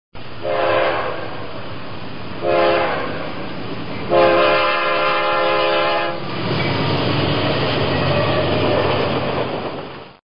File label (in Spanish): tren